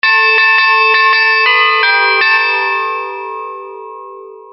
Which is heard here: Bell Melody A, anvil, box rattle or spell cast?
Bell Melody A